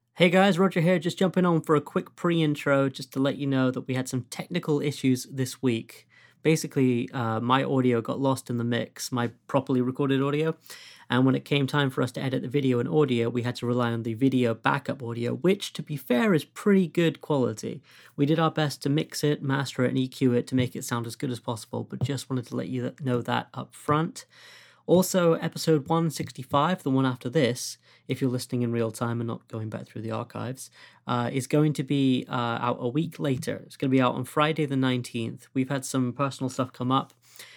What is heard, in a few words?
guitar solo; weeklysongpodcast; songwriting